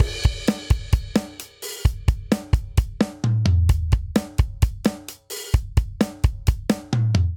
Driving Rock Verse Variant 130 bpm, Drum; 4 4; MIDI Clips; Ableton; Factory Packs; Drum Booth

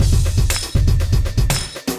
Acoustified Kit, Drums

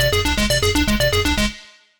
Arp, Synth Rhythmic; Arp Code; Ableton; Factory Packs; Punch and Tilt

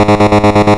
Sawtooth Trem G#1; Ableton Foundation FreePacks; Foundation Glitch Toys & LoFi

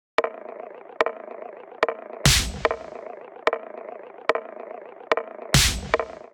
Tops 146 bpm; MIDI Clips; Ableton; Factory Packs; Skitter and Step

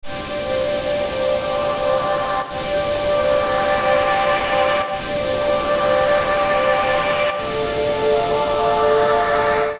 Space Pad Chords C minor 80 bpm; MIDI Clips; Accompaniment; Ableton; Factory Packs; Chop and Swing

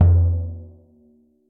Surdo Open; Latin Percussion; Drums; Drum Hits; Ableton; Factory Packs

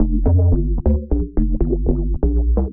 Add Square Shifter D Minor 175 bpm, bassline; MIDI Clips; Tonal; Skitter and Step; Ableton Factory Packs

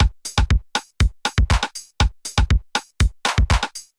707 Kit groove 120 bpm; MIDI Clips; 111 - 130 bpm; 707 Funky Beans Kit groove; Ableton; Factory Packs; Drum Essentials

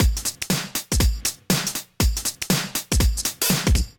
Foundation Hop Kit, Drums; Ableton; Factory Packs; Vibrations; ModeAudio

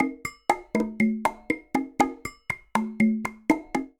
Bossa Nova, Latin Percussion; Ableton

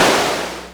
Clapbox Noise; Ableton Foundation FreePacks

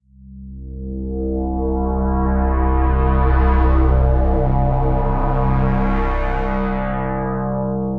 Constellation Bass, Evolving Bass; Ableton; Drone Lab

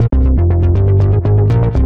Ascending Sequence; Ableton; Creative Extensions; Racks

